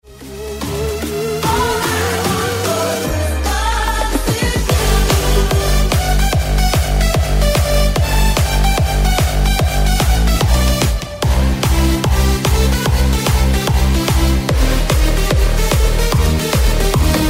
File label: techno